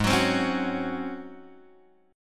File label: G sharp 11th G sharp; G#11 Chord {4 X 4 5 2 2} strummed